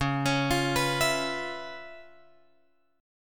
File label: C sharp 7th Sharp 9th, chord